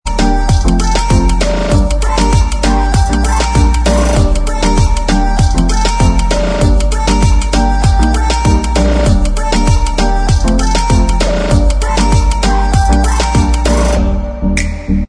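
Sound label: Inst Track, instrumental track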